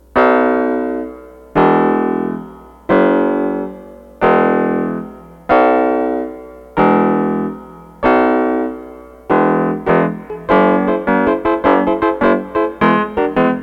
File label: Synths microtonal scale